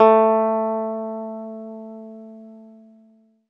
ZITHER; Best Service; Alpine Volksmusik

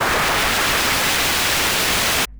Misc Noise; layering

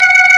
ORG VK, B-3 ORGANS Vinta Keys B3

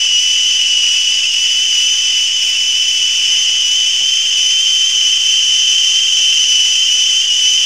whistle, Tea Party; SFX

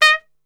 D# 4 PIC SHT, Brass; Best Service; Brass Super Section

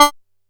D5, Bass; E-MU Producer Series Vol. 6 – Analogia Project; Boom da Bass

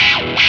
wah loop; E-MU Producer Series Vol. 8 – Platinum Phatt; Best of Phatt

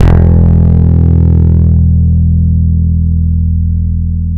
FUZZ E 2, bass; Spectrasonics; Bass Legends

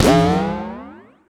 BOING; Distored Reality

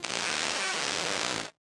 Casio, Glitched, malfunctioning synth

